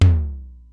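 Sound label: Simmons SDS-9 low tom; Factory B4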